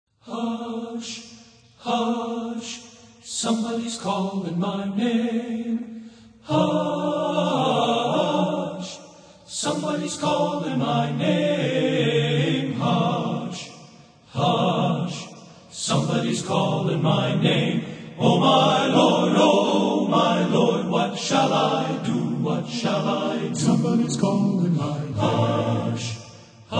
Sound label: Men's Choir